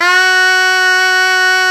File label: SHENAI F#3